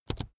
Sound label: keyboard laptop space press fast soft